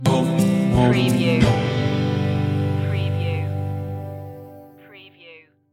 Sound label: tap your knees stinger